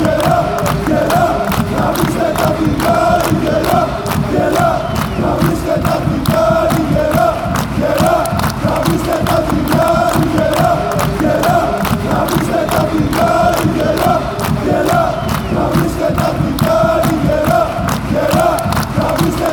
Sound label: aris chant